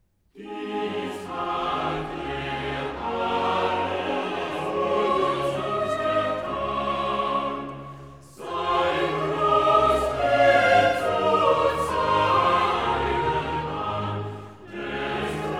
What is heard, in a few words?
Chorale; Dies hat er alles uns getan; BACH; Christmas Oratorio